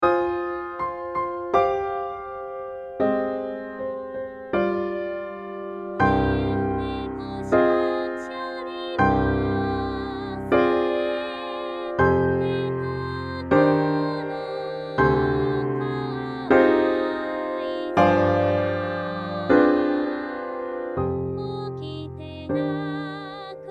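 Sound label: female alto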